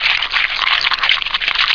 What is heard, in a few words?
walk; scourge